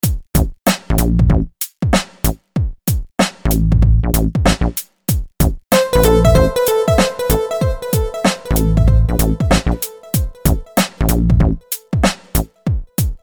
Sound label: Pseudo Hip Hop; Piece featuring a Moog bass, a synthetic drum kit and a digital synth sound; samplefarmpro